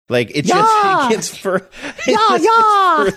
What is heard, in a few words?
singing ja while talking